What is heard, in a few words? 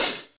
Crisp Hihat Sound A sharp, Royality free hi-hat sound tuned to the A# note